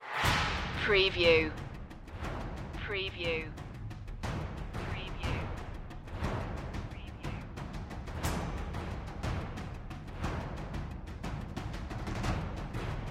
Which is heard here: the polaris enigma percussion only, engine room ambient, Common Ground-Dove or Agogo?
the polaris enigma percussion only